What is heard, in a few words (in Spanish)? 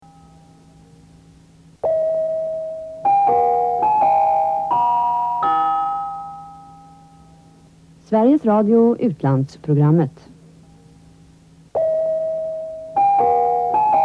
Radio Suecia Interval Signal